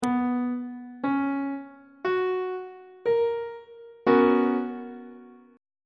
c flat major 7th suspended 2nd chord on treble clef